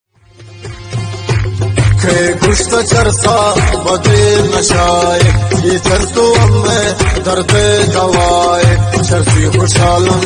music baloch remix

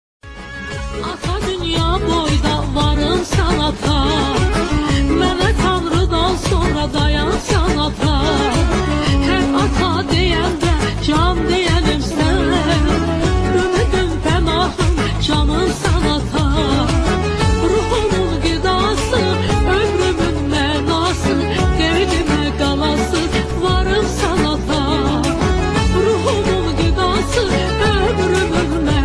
music torki azari

